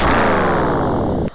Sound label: sonic laser fire